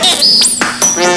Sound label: Spike